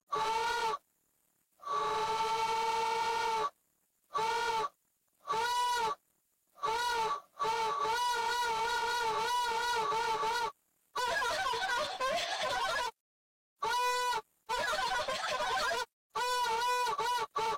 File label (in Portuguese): gemidinho sexy